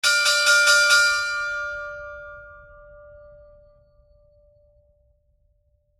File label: End of Trading Bell